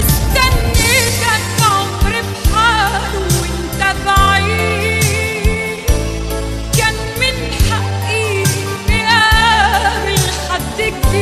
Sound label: Arabic Sad Song